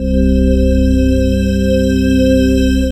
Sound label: C, Drum & Bass Pads; Total Drum&Bass; 90 s Sample CDs